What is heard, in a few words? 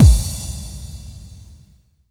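bass drum FX, dance drums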